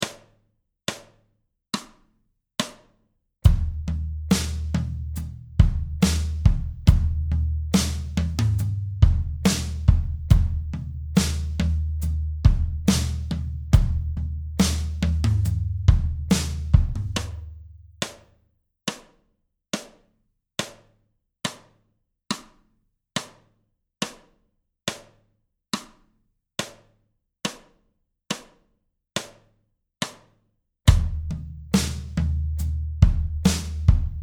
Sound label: 70bpm Timing Playalong